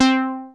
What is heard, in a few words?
Analogue hits and octaves